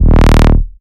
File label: Bass Shot D#